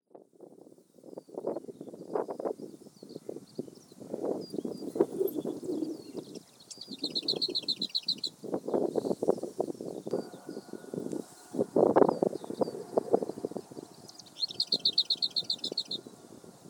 Lesser Whitethroat

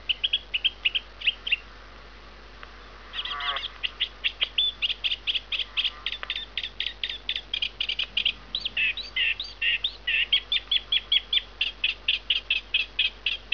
reed

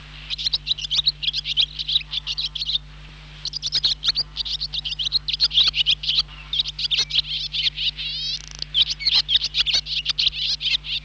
swallow

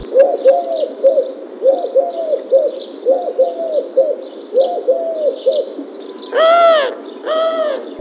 collared dove

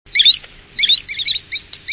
green sand

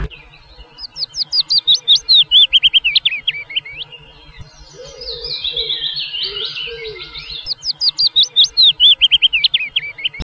willow warb

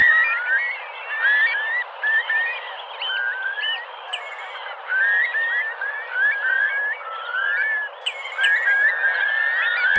curlew

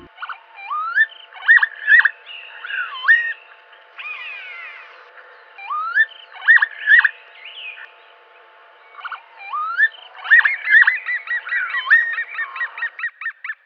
lapwing

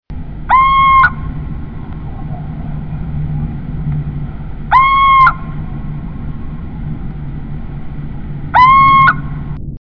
Little Owl

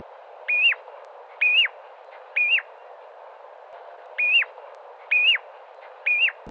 ringed pl

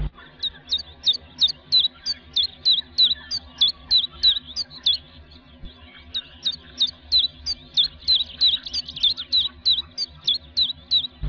chiffchaff

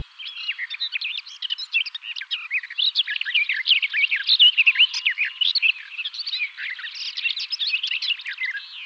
garden warb

